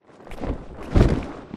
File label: flyer fly med dist; Titanfall 2